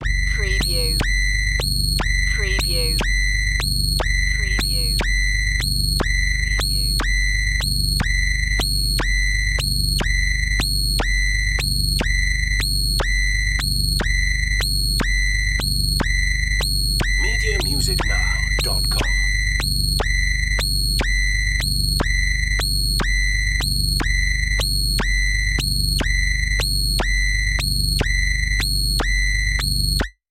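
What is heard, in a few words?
Alien Warning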